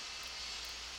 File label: Background Noise